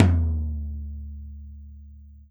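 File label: 14 tom, Drums&Percussion; E-MU Producer Series Vol. 7 – Old World Instruments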